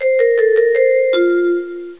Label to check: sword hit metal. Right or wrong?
Wrong